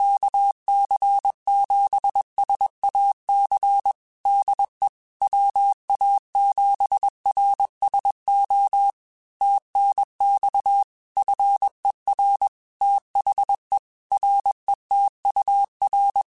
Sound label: Morse Code, QSOs